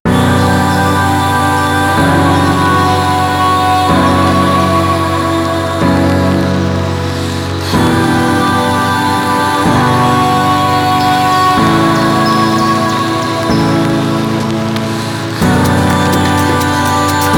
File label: stock music